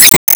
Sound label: Spike Hit